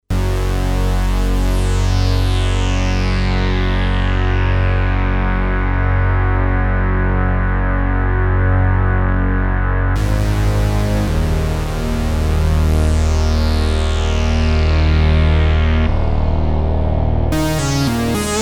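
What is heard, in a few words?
Lead New Drone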